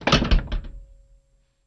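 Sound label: Int Door Close, doors; Cutlass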